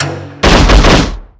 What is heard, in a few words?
hunter spring trap